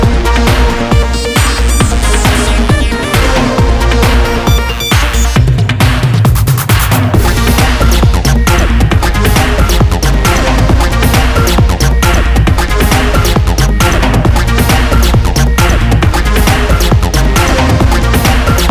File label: Techno loop; The Fast and the Furious Super Cars arcade gamerip 2010